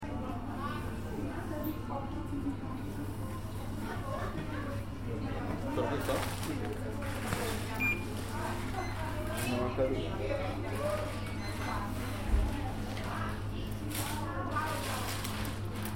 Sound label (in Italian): supermercato; borgo sacco